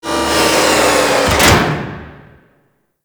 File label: Shipyards Robot Arm Deactivate; Ambience; Props; Warframe; Grineer Shipyards